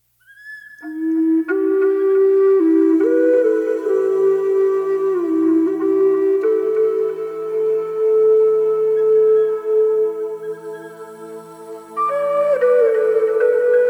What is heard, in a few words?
Japanese Flute, Nature & Keyboards for Massage; spa